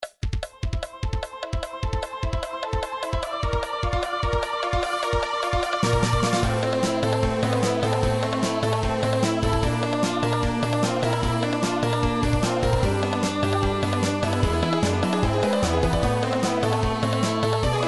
famicom, midi; japanese famicom rock board japan tranquil green board 1

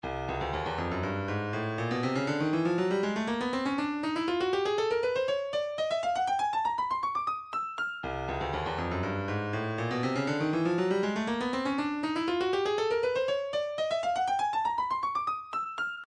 120 Full Mix, midi

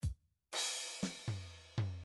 midi drums bossa

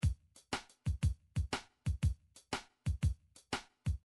drums, midi drums

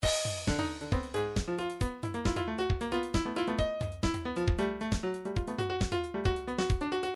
trance midi loops beats sfx; Newtronic Trance Constuction Kit

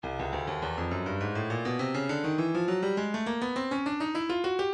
breakbeat midi, 102 bpm; forward a; Stylus Breakbeat Midi Files

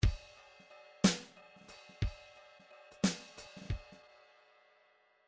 midi file grooves 3 4

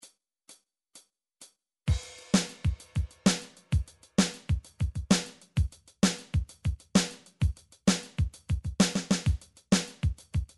22pop

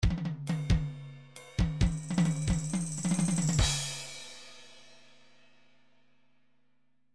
trance midi loops fills run away; Newtronic Trance Constuction Kit

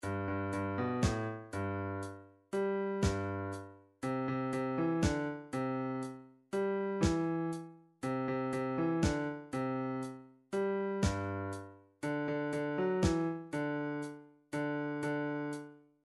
drums reggae, midi drums

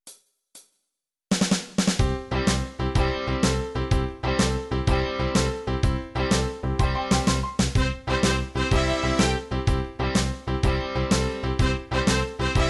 rock

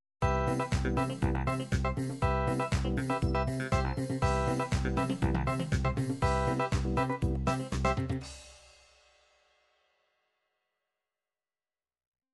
disco, midi

